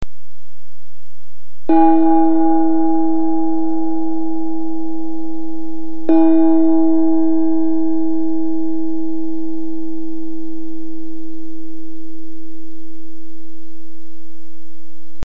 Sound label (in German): tischgong